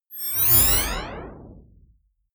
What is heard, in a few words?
screen turn on whoosh heavy; energie